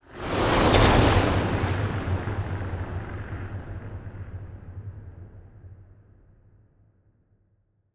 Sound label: ship battleship move; Stellaris; toxoids; ships